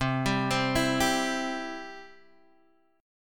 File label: C Major